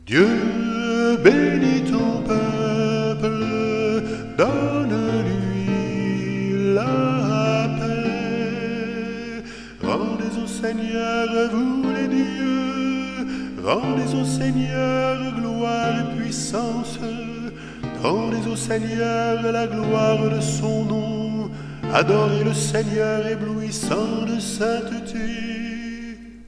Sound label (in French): Psaume 28 Dieu benis ton peuple